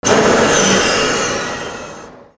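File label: machine decompression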